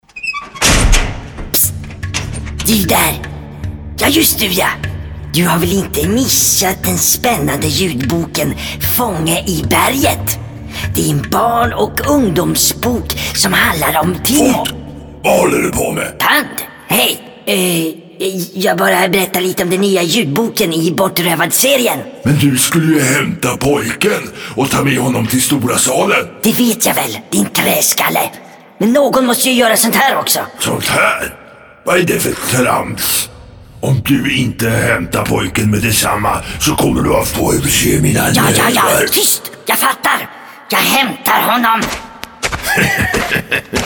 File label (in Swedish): Reklamjingel